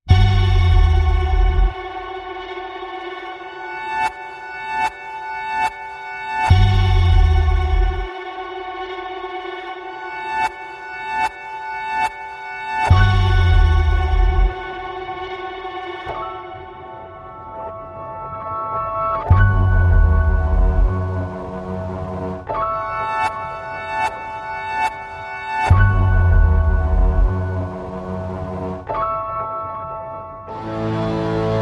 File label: Cruising The Coast No Perc